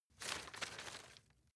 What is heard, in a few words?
grab rustle short soft; berufe; foerster